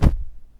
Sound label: mob die